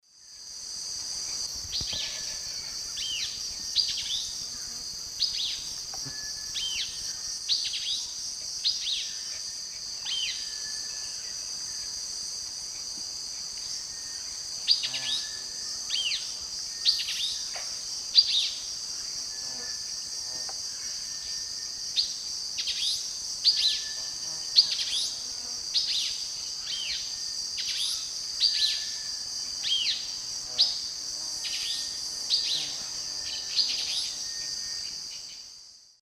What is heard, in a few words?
Ramphocelus carbo, slow song; S. America; Eastern Lowlands